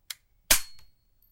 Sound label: gun reload